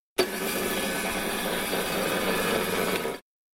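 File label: metal cable sounds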